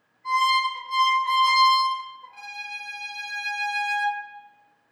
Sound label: Four Notes, Violin; Cheryl Violin Project